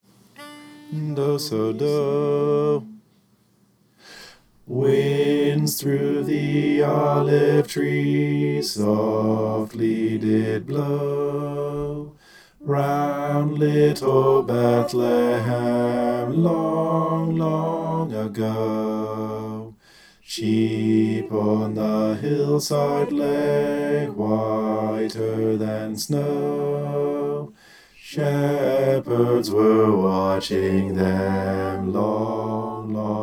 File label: bass